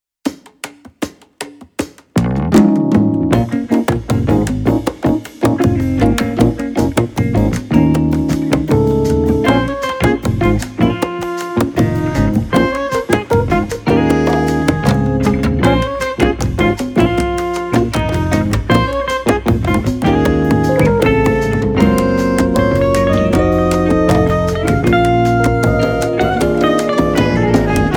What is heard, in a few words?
tam tam